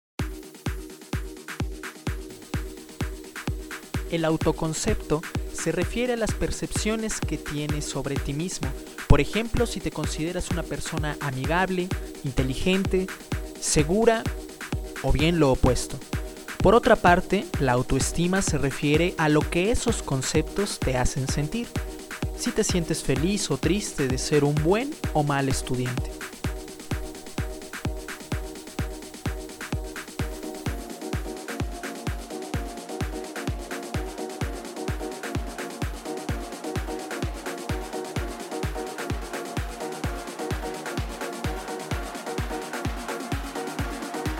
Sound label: pop